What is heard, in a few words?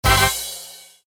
bonus chance; Red Chilli